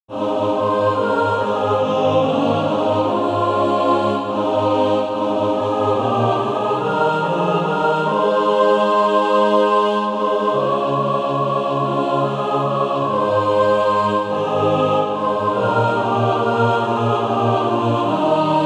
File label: mixed